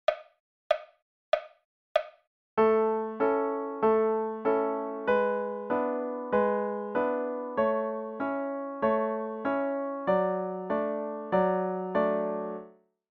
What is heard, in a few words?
Up the A String